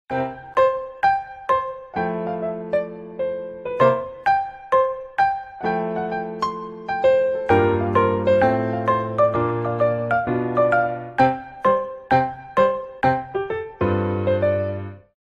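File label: Galaxy J5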